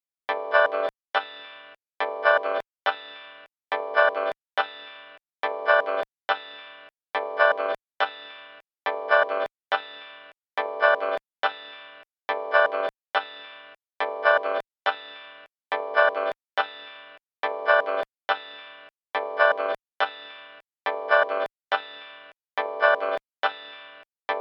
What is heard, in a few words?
Ordinary Groove